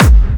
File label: Clubby Kicks, Bassdrums; Vengeance - Essential Clubsounds Vol.5